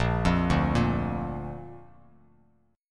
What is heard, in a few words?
A sharp Major, down, Bass Standard; arpeggiated; chord; JGuitar